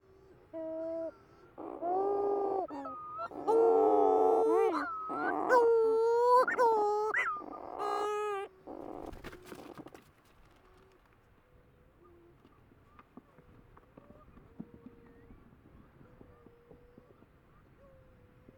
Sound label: Cape Verde Shearwater